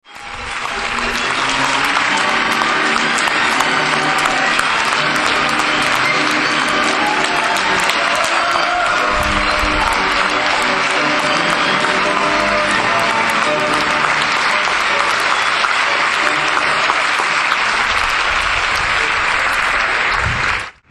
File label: applause